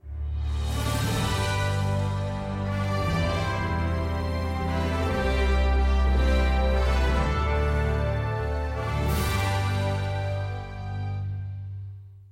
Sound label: bacon fanfare